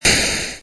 tile explode; doublespeakgames

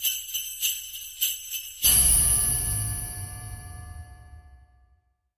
jingle big

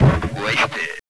fire wasted

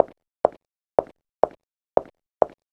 walk

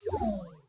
train cube hide; crosscode

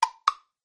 chain; tenaPuyo

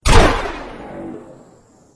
tank Fire, enemy Weapons; gamegape

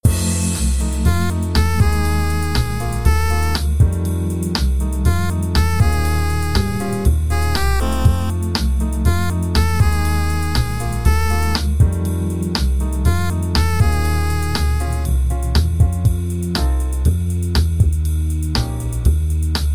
Bar BGM

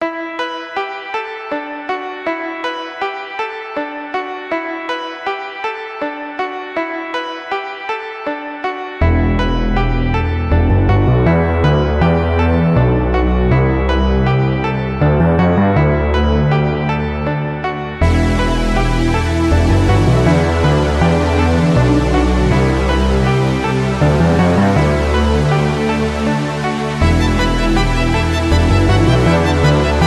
Distant Calling; playescapegoat

